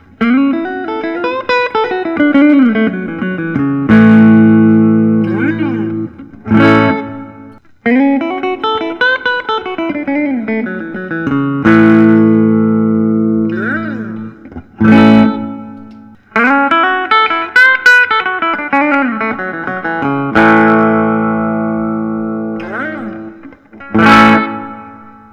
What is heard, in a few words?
Gibson Memphis 63 ES 335 TD; vintage sunbu